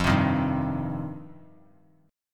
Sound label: F 7th Suspended 2nd F down; F7sus2; strummed; JGuitar